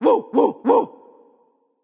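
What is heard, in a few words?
yay, female; smite; voicepack; hera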